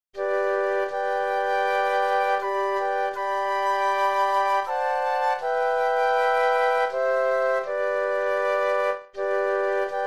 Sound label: Flute Quartet